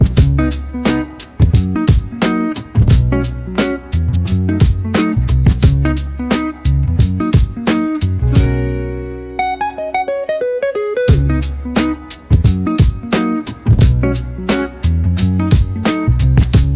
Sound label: Midnight Vinyl Blue, lofi jazz hop